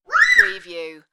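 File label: Child Quick Scream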